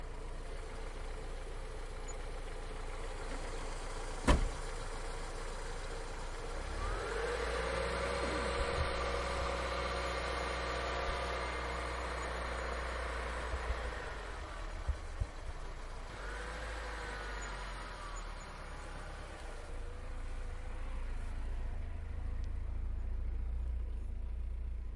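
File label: sounds of Niva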